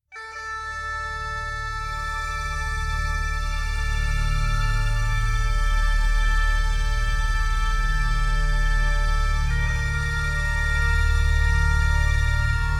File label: Exploration; Skellige